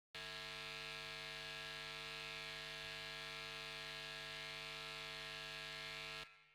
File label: Pickups